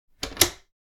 Steam lever machinery mechanical push turn on

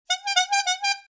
Clown horn

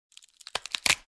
Light bone crack

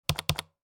Mechanical keyboard keystroke pressing multiple

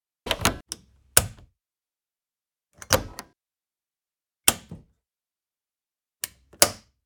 Helicopter inside interior pushing buttons

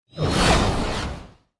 Sci fi transition futuristic vehicle whoosh

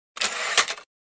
Electric typewriter carriage return

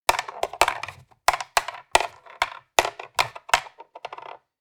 Chess pieces drop on chessboard; leisure

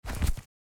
Leather wallet taking from pocket; foley

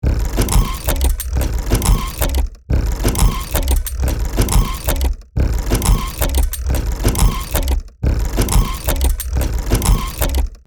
Steampunk machinery automated loop

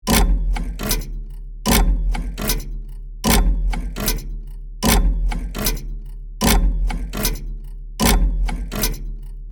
Peculier clock massive ticking loop; steampunk; gfxsounds

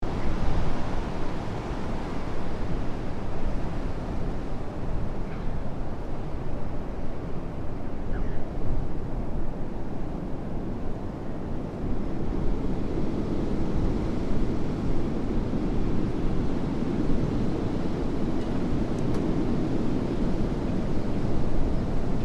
Strong wind gusts wind blowing ambience

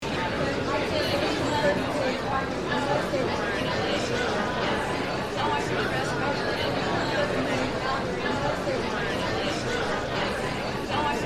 Station cafe ambience busy crowd murmur; restaurant

